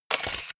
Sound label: camera